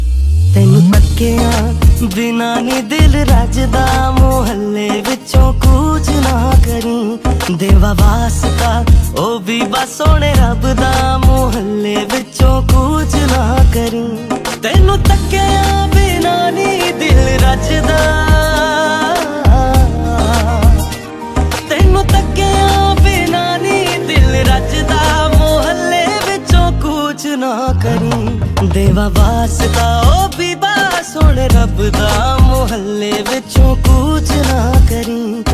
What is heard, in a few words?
Bollywood Song; Tiger Zindaa Hai